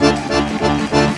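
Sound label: accordion groove; Best Service; World Colours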